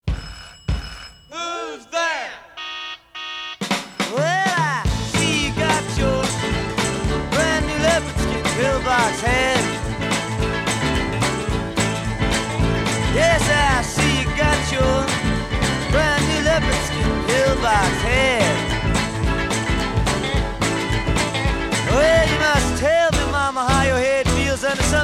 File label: breakdown